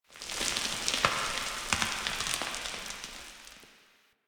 Small Dust Rock Slides One Off; Warframe; NineteenNinetyNine

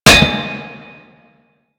Metal Pipe Drop; Warframe; Ambience; Nineteen Ninety Nine

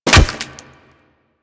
Melee Staff Impact Flesh

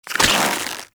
Gas Arrow Tether Attach, Weapons; Warframe; Infested; Derelict Cernos Prime

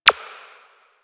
Button Press; Warframe

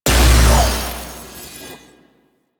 Portal Transition Stone To Shattered

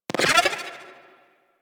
Keen Senses Activate; Warframe; Catbrow; Mods

